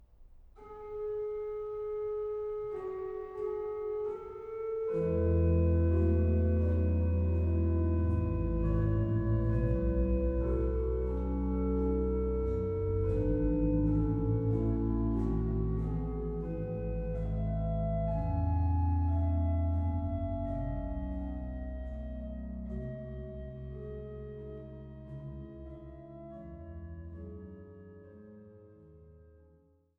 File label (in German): Sonata Nr. 4 G-Dur op. 88 II Intermezzo, Andante con moto